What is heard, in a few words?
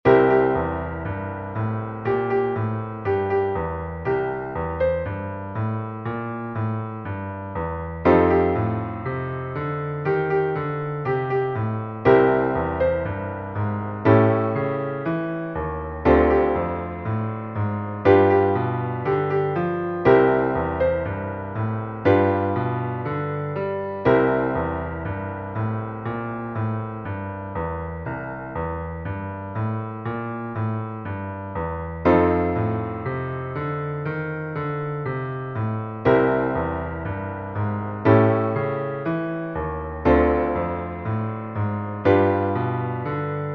Exercise, Piano Warm Ups